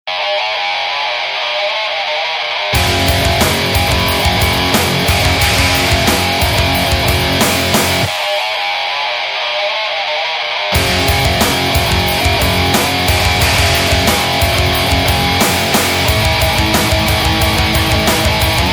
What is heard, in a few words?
riff